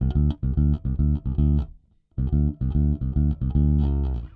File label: Rock, Bass; Bass X